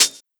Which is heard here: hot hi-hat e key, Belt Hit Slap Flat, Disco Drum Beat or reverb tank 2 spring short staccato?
hot hi-hat e key